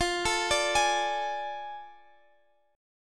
A Major 7th Suspended 4th Sharp 5th, chord

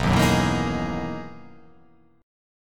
B Major 13th B down

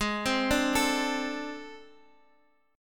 A Minor Major 7th Double Flat 5th

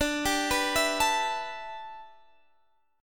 E Minor 11th D

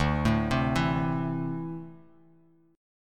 D 7th Suspended 2nd down Bass Standard; D7sus2 arpeggiated

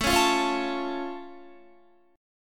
A Augmented A down Guitar; A+ strummed

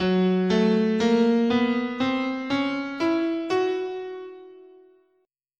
F# Mixo Blues, scale

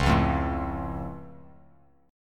Db Augmented Db down Bass Standard; strummed; JGuitar

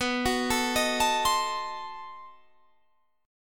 B 7th Flat 9th B, guitar chord

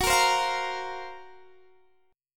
Gb Major 7th Flat 5th Gb, Guitar Standard; GbM7b5 strummed; JGuitar

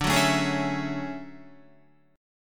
D Major Sharp 11th, down Guitar; strummed; JGuitar

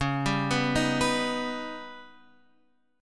Eb Augmented 7th Db

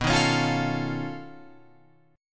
B 9th Flat 5th B down Guitar Open F; B9b5 strummed; JGuitar

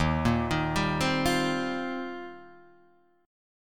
Eb 6th Add 9th, Guitar, DADGAD

